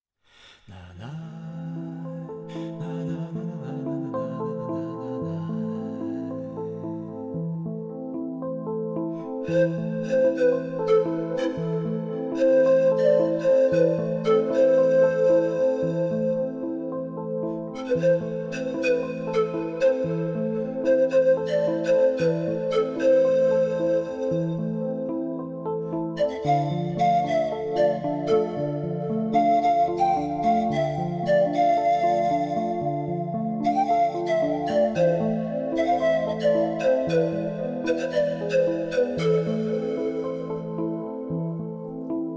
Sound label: lonely flute